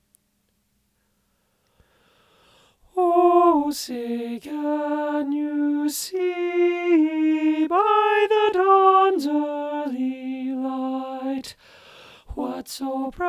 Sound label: ovation ssb alto 1 middle, choir